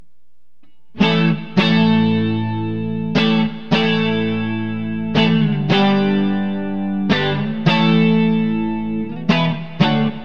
neck clean; burny; fugly clips

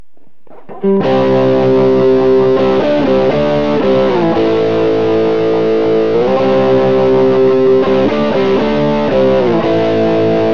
neck crunch; classic57; burny; fugly clips

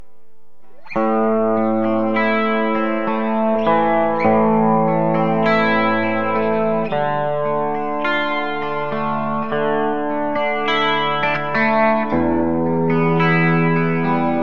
darkburst bridge clean specpick; burny; fugly clips

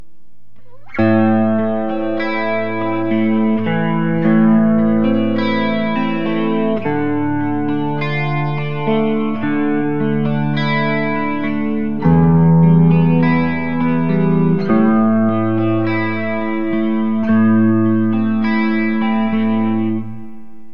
darkburst neck clean specpick; burny; fugly clips

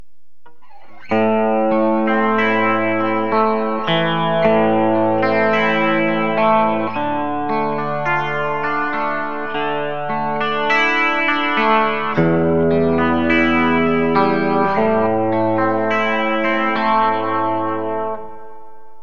crossroads bridge clean specpick; burny; fugly clips

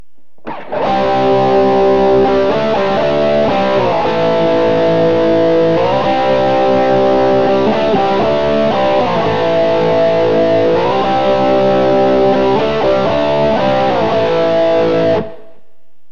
bridge crunch; pg; burny; fugly clips

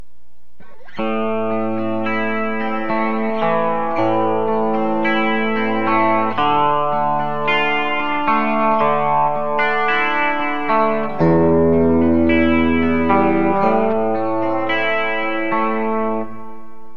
fugly bridge clean specpick; burny; fugly clips

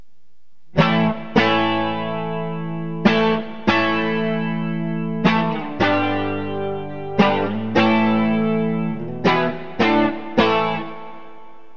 dirtyfingers bridge clean, burny fugly clips

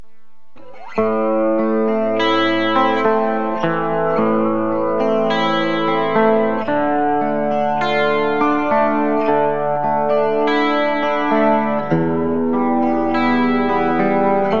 emg81 bridge clean specpick; burny; fugly clips

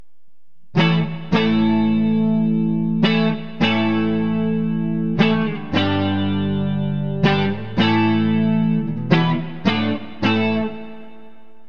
neck clean; dragon; burny; fugly clips

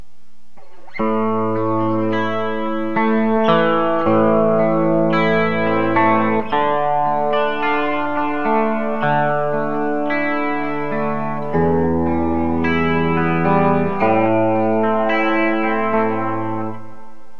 dirtyfingers bridge clean specpick; burny; fugly clips

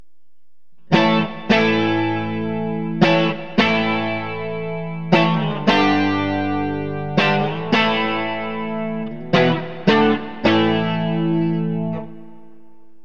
bridge clean; burny; fugly clips

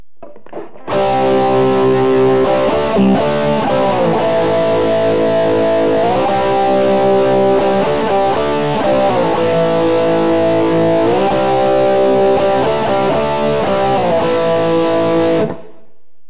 bridge crunch; burny; fugly clips

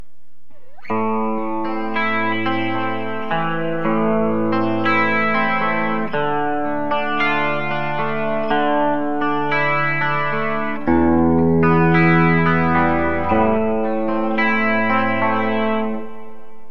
sdcsgold bridge clean specpick; burny; fugly clips

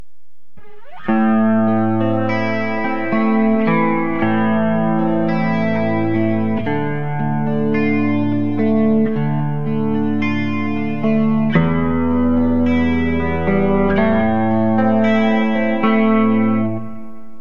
thotrail neck clean specpick; burny; fugly clips

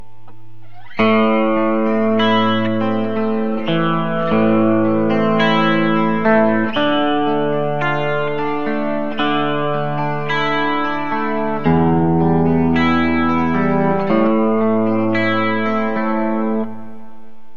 phatcat bridge clean specpick; burny; fugly clips

